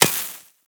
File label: Laser Impact Metal; Warframe